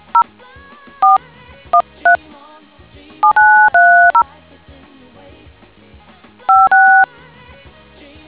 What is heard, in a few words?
dtmf